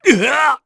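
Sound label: Vox Damage, Korean; Lusikiel; kingsraid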